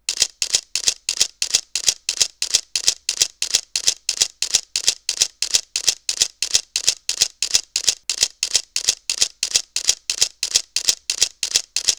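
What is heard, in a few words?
Sony Alpha DSLR-A900 continuous low JPEG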